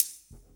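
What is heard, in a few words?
snare drum rim shot; 90 s Sample CDs; Best Service Dance Mega Drums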